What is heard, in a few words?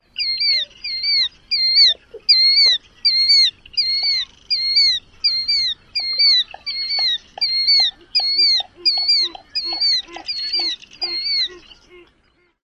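Killdeer, flight display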